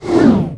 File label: conj FAIL, magic; The Elder Scrolls III - Morrowind; Morrowind GOTY GOG